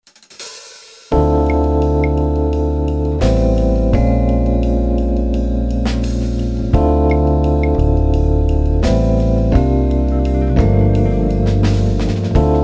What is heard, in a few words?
instrumental